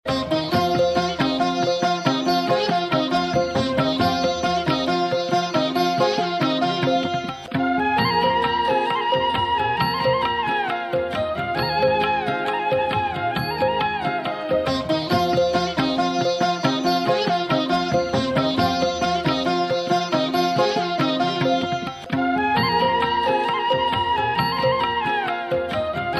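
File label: Tamil Instrument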